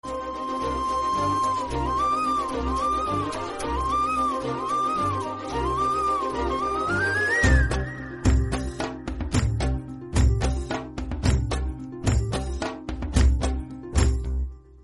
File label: telugu ringtone